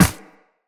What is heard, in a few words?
Run Ice, Footsteps; Characters; Operator